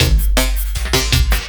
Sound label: LOOP, 160 BPM, Drum & Bass